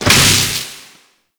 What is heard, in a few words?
Fortress Cable Connect Stop; Warframe; Ambience; Grineer Fortress; Fort Spy Vault C